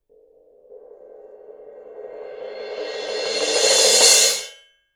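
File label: suspended cymbal roll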